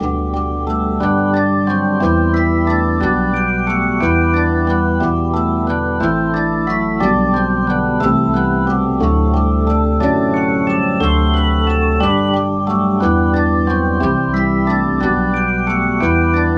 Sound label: percussive organ bach